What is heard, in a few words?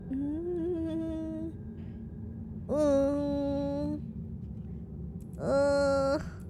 hesitating; noises